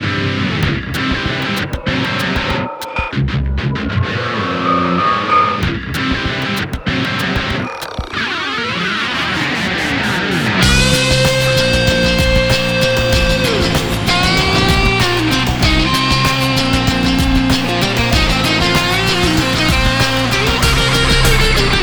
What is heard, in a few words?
Campaign Music victory; DCS